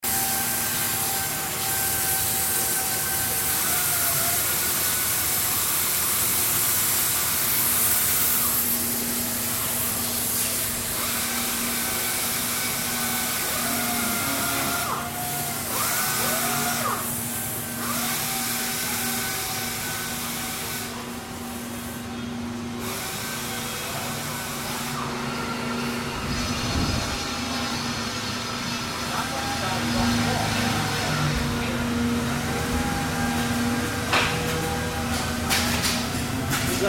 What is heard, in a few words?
CUTTING WOOD; HUDSON